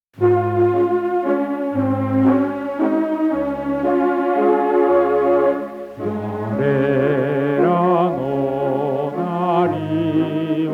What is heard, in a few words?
rotary song